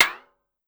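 Timbale Edge Up Fast, Drum Machines Clavia DDrum-AT Pitch Envelope; Pitch Env Various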